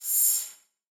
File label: contained magic; soundscrate